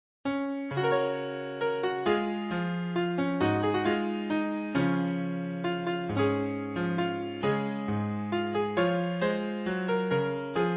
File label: Brahms Lullaby